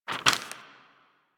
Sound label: Putdown Paper; Warframe; Cephalon Weave